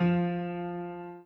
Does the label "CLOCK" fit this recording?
No